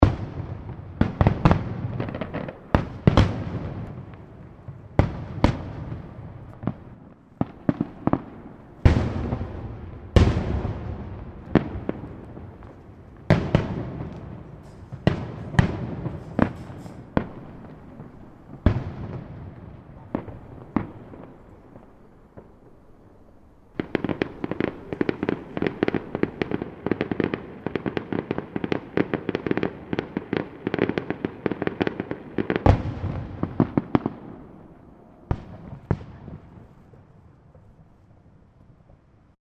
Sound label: Fireworks Display; Backgrounds; Crafted Signals